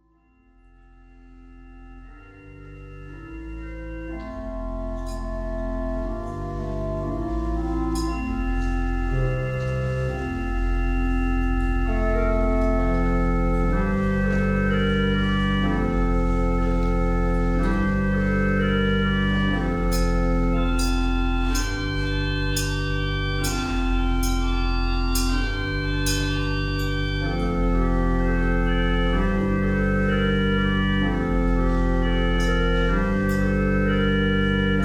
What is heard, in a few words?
organ